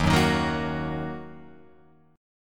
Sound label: Eb Minor Eb down Guitar Open F; Ebm strummed; JGuitar